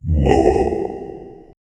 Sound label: deep guttural demon chant